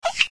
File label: robotz exclamation, windows sound schemes; windows 9x